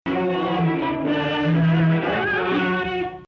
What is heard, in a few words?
Rast (Sikah emphasis), maqam